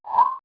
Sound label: slider; Microsoft Graphics Studio Greetings; Microsoft 1998